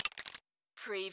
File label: CARTOON DROP